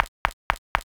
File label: footsteps; adarkroom